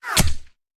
Bullet Impact; Sound Ideas; Guns

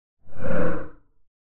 Whoosh Ultra Low Rumble, Drone - Short; Hollywood Edge; sound designer's toolkit v2

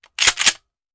Shotgun Foley; Sound Ideas; Guns

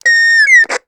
Walkie Talkie Feedback; Communications; 1001 Sound Effects

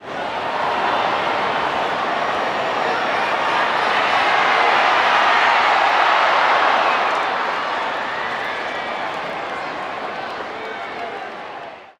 Baseball Crowd Reaction Hit; Crowds

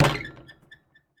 Street Ball Glass Back

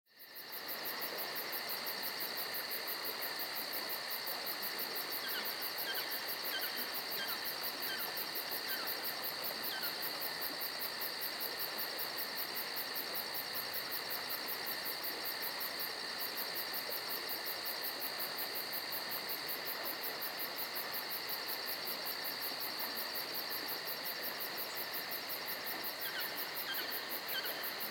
ambience forest; NCH Library

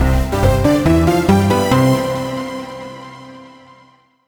MUSIC EFFECT Platform Positive; stereo; Universal Sound FX; MUSIC EFFECTS

